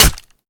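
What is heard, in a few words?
melee character gun small steel; viper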